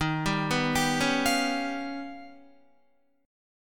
Eb Augmented 9th Eb down Guitar; Eb+9 arpeggiated; JGuitar

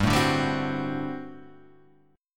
G Major 7th Suspended 2nd Suspended 4th, chord; GM7sus2sus4 strummed; 3,0,0,0,1,2

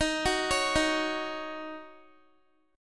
Eb Minor 7th, down, Guitar; arpeggiated; 4E,4A,5D,5G,5C,6F

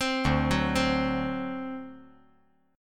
D 7th, down

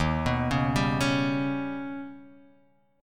Eb Augmented 7th Eb; arpeggiated; x,x,1,4,1,2,3,x

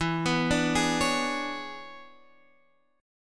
E 13th; arpeggiated; JGuitar

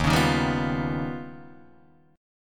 D sharp Augmented 9th D sharp Guitar Open D

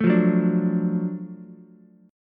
Gb Minor Major 7th Sharp 5th, Ukulele, chord; strummed; JGuitar

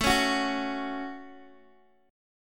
A 6th, Guitar, Open D; x,12,11,12,9,x

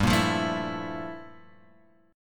G Minor 13th G; Gm13 Chord {3 1 3 2 X 0} strummed

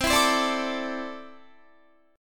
C 6th Add 9th C down Guitar; C6add9 strummed

